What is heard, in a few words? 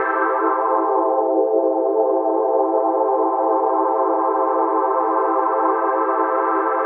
TECHNO PAD 140; Keys